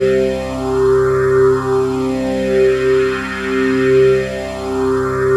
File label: PHASE PAD, ATMO PADS; Best Service; XX Large